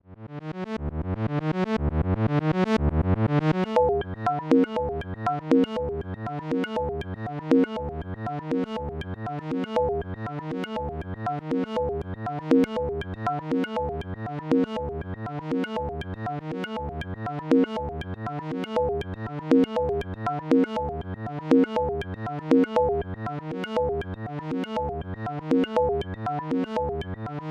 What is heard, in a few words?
Rossum Electro Music Morpheus Auto Clang